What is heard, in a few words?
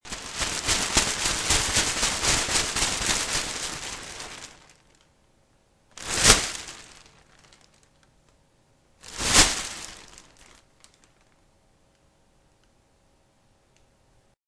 plastic bag